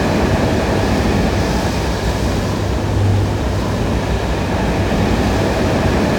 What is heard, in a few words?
Blackhole Loop; Folk Hero